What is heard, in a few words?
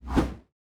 glaive pierce